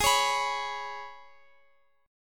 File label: G sharp Minor Double Flat 5th G sharp, Guitar Open F; G#mbb5 strummed; x,x,x,15,16,18